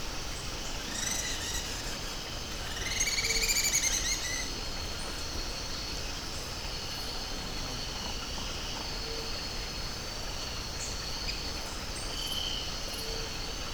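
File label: Bolivia Coroico Jungle Ambience Exterior; Pachamedias; Bolivia Jungle Vol.1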